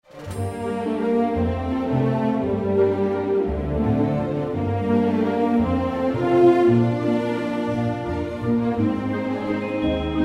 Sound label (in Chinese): 专题片背景音乐; 30秒以内